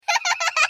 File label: Creative Giggle; F.A.M.P.S